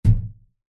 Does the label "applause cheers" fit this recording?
No